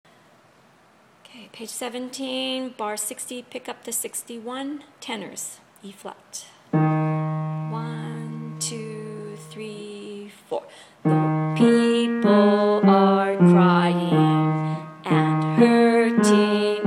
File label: Tenors